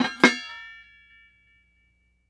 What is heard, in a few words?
Industry Tool Spade Set Down On Wood